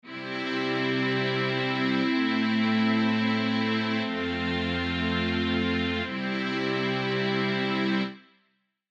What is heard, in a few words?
common chords